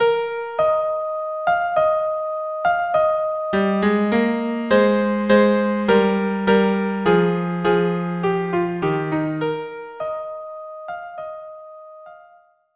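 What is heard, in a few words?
violin viola duo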